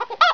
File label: chicken; Exile III v1.0